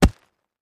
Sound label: Concrete Chunk Drop; rocks & dirt